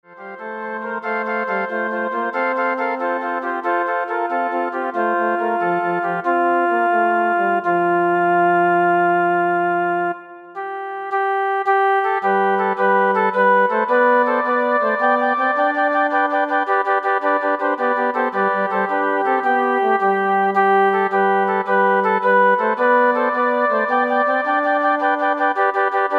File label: Flute Ensemble